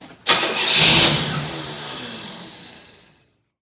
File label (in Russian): zvuki startera avtomobilia